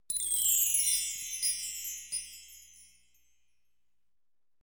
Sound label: Glissando